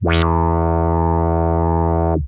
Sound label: Arp D E2, ARP Odyssey, synth samples; musicradar-synth-samples